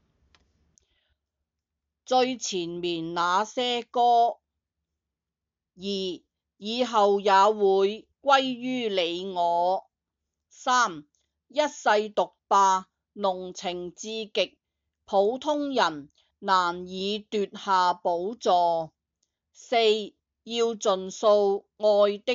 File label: A Powerful Love Song